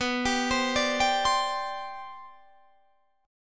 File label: G Major Add 11th, B, Gadd11/B chord; arpeggiated